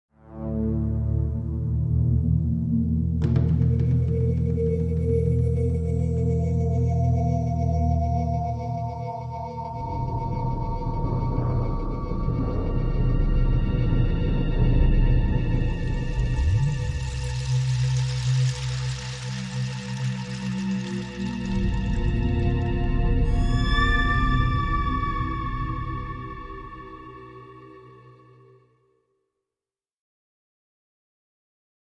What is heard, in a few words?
Ominous Footsteps